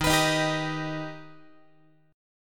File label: D sharp Augmented D sharp Guitar; D#+ strummed; x,11,14,13,11,14; JGuitar